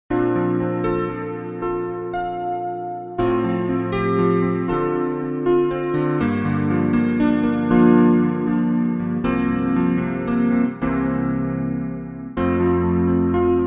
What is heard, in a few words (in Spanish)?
. Pista instrumental sin melodia